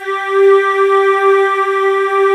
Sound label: BREATH; East West; Global Sounds